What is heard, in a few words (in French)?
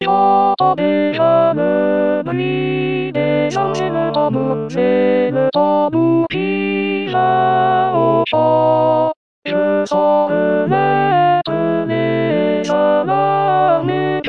Alto; L'homme armé